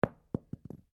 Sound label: Cartridge 22 250 Rem impact carpet, shell casings; shell casings of different calibers impacting on different surfaces